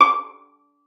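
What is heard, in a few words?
violin pizzicato D5; Xsample VOL-5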